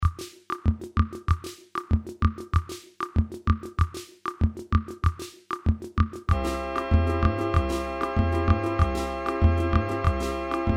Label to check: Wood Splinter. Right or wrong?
Wrong